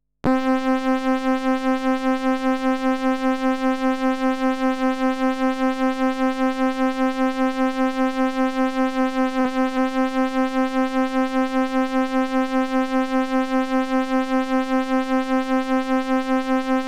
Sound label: Toy Synth Stylophone High C Vibrato On, Circuit Bent Toys; Submerged Tapes; Circuit Bent Toys Volume 1